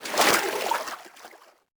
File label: wade, Footsteps, Water; civ13; civ14